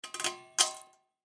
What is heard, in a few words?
Lamp Turn On, Foley Lamp Turn On Pull String; Lamps; Household; Sound Effects:Household; SoundEffects-Blastwave